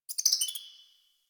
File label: Tree Critters Happy Calm, Ambience; Props; Warframe; Duviri